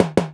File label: BD room; EMU Orbit-3